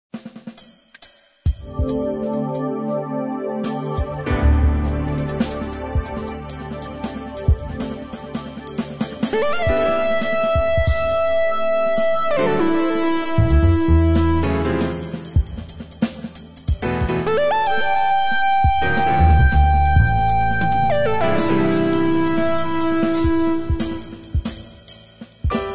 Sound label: Free Funky; Suite Collection Vol.1